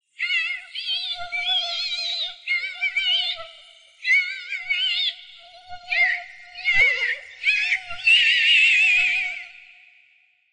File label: help me fly voice